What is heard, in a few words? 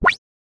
game button; the sound pack tree